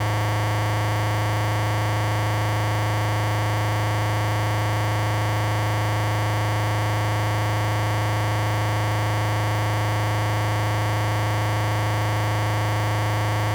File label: Harsh Noise Feedback Loop; Glitchedtones